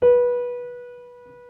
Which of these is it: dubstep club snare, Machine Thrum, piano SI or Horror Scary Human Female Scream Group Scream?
piano SI